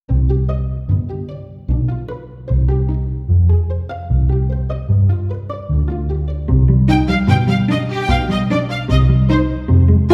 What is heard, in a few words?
quirky errand